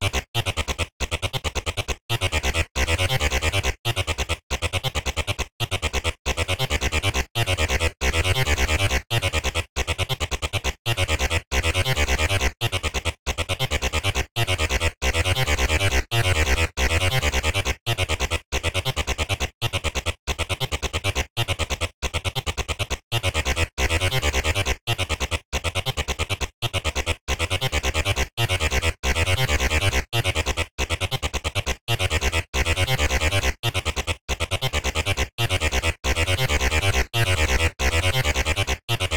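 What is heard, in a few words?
acid pit techno bass sequence C minor 137; loops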